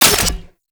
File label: Alt Fire Mech, Weapons; Warframe; Corpus; Tenet Quanta